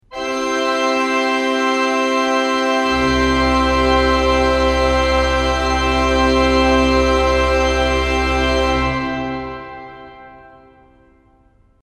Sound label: tutti